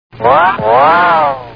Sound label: whoot whoo; three stooges